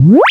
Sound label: Blaster Fire, cartoon Foley Fx